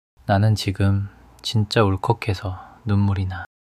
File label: crying